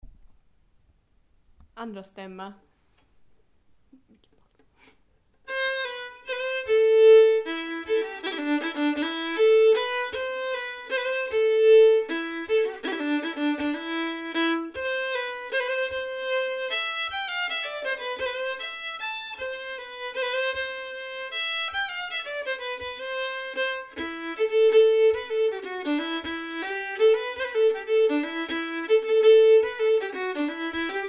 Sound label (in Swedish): Andra Stämma